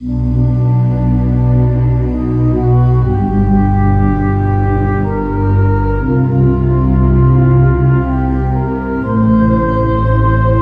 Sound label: Fantasy SPOOKY; Dieter Schwartz